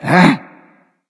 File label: confused; smite; hachiman